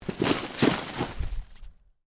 Body Movement Snow Move; Body movement foley to simulate falls and deaths; Human; Bodies

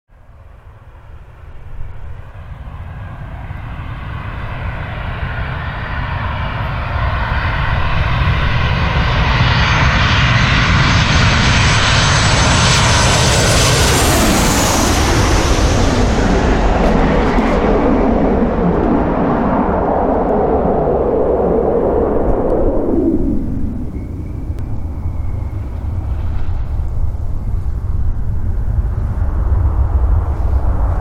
Jet Aircraft; Jet aircraft passing overhead and landing with reverse thrust.; Airplanes

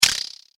Rattle Brush; Andrew Fleming

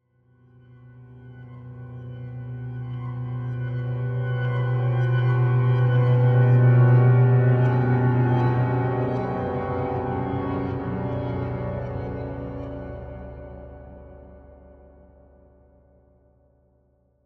Horror, Musical, Chimes, Sweeper, Mysterious; Scary Soundscape Sound Effects

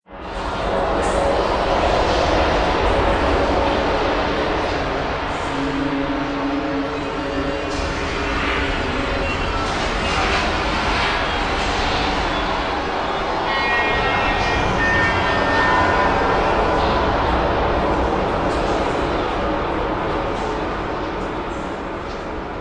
Scifi Mystery Drone; Drone Sound Effects

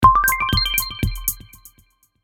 Musical Drums, Musical Drums Twinkle Short; Miscellaneous Musical; Blastwave

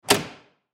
Fire Truck Door, Fire Truck Door Close Distant; Emergency; Emergency Vehicle Sound Effects

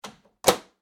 Household Microwave Door Close; Microwave Doors

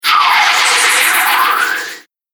Explosive Phase Whoosh

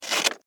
Office Envelope Manila Tear Open Short; Offices; SFX Bible

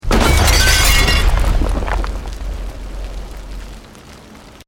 Explosion Medium Brick Wood Glass

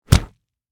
Impact Punch Body, Impact, Punch, Body, Hard; Punches and Hits; Combat

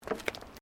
FOOTSTEPS THONGS (FLIPFLOPS) WOODEN DECK STEP

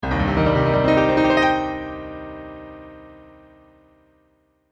Cartoon Music Piano Arpeggio Ascend Minor

